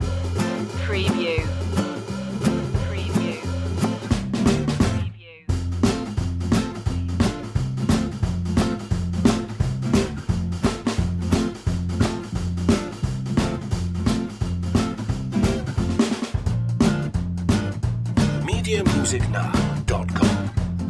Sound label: guitar bass drums